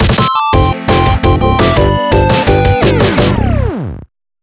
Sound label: Classic Arcade Video Game Music